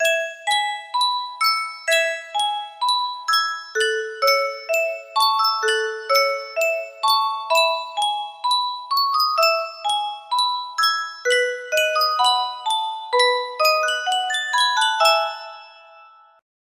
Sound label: music box; yunsheng custom tune